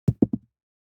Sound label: DICE on Felt, Throw and Roll, Metal, 1 One Die; Samples; ArticulatedSounds; DICE Sfx Party (Extended)